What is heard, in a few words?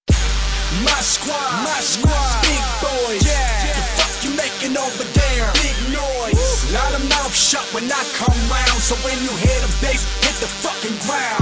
big bass mix